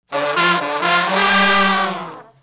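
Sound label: Shuffle Blues Riff (G) unison falloff w growl, Horncraft for R&B Sections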